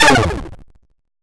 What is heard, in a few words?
Hit Hurt